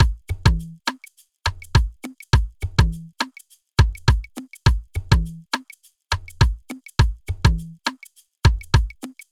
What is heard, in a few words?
Drum Loop BPM 103